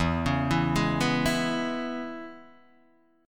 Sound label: E 6th, down, Guitar, Open D